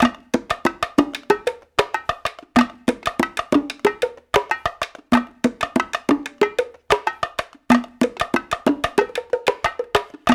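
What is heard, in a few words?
93 bpm, bongo tonga; Tongatown; Spectrasonics; Supreme Beats; African Contemporary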